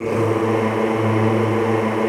A1-R, Extended Classical Choir; Best Service

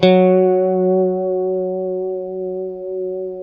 F#3, strat, JC120; Spectrasonics; Hans Zimmer Guitars VOL-1; JC-STRAT MF

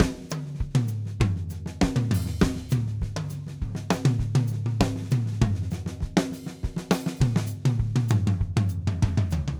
Drumset Solo; Sonic Foundry (Sony Creative Software); Joe Vitale Latin Percussion; Solos

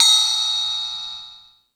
Hip Hop, Drum Machines

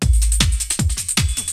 BREAKBEATS, TECHNO

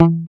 GUI MUTE, E-GUI MUTED

